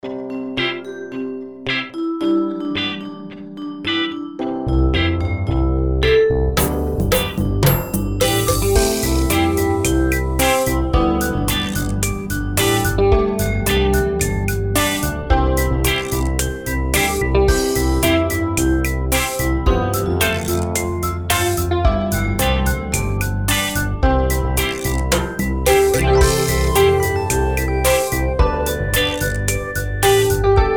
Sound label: Happy Birthday to You Reggae Version